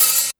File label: HH, Dance Mega Drums; Best Service; HH-HIP